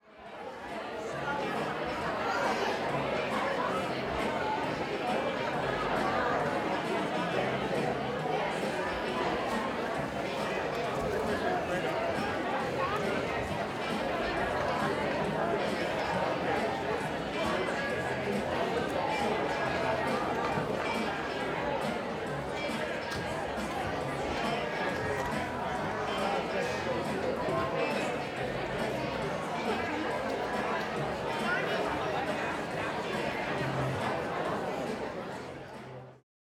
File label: Audience Chatter